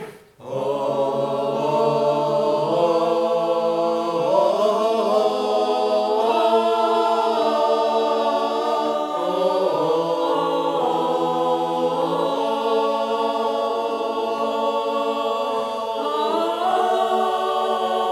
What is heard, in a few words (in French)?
Alti, Ténors et Sopranes, alti appui au démarrage